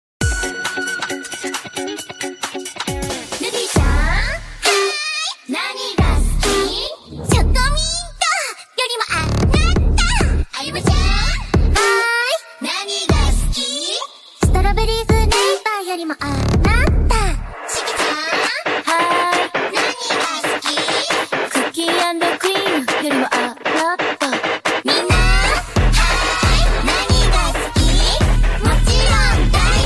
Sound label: Epic K-Pop and J-Pop Mashup